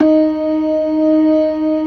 LOW MAN, organ combos

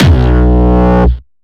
Hard style kick

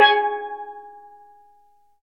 steel drum

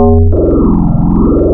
form, wavetables, Classic Synths

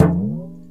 PERC KALIMBA

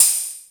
Beat Box, E-MU Pro-Cussion; drum machine

